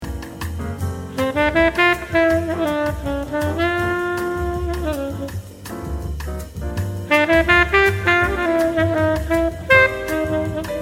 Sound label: instr. Saxofon